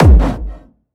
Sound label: Distortion Kicks, Bassdrums